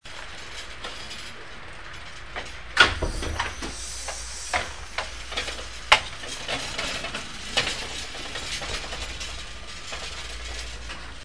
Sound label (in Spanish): Camilla